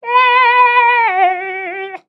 Frog Hat Sing; creatures organic; Ribbit Frog

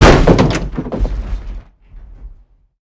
SUSPENSION IMPACTS, vehicles; aronwk collection; ndaudio

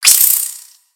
Spider Attack Melee; Lego; creatures mechanical

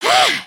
surprised; emotes

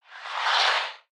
Ice Oneshot; Spinjitsu

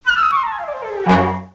Flute

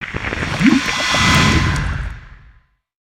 Maelstrom Geyser; spaceship

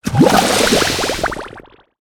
Soapgun Shot

